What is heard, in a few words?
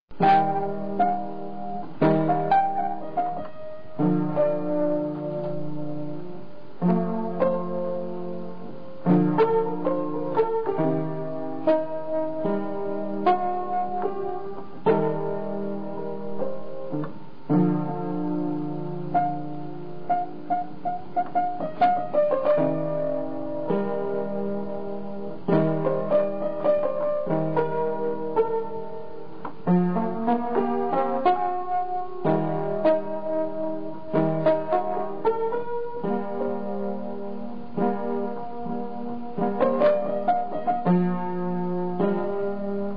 piano